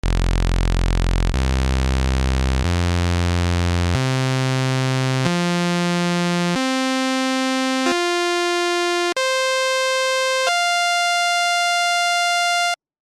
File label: JP 08 saw; Roland Boutique Jupiter JP-08 Synthesizer